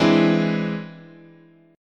E Augmented 7th, chord

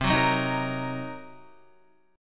C Minor 7th Flat 5th Guitar, chord; strummed; Cm7b5

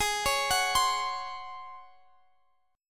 C sharp Major 7th Suspended 4th G sharp

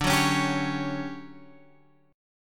D Major 11th, chord

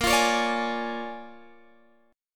A sharp 7th Sharp 9th, Guitar, Open G

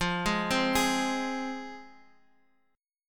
F Minor, F, Guitar; arpeggiated; 13,11,10,13,x,x; 4E,4A,5D,5G,5C,6F

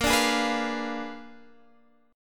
Bb 7th Flat 9th Bb down Guitar Standard; Bb7b9; strummed; JGuitar

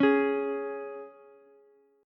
Db 5th Db down Ukulele; Db5 strummed; JGuitar

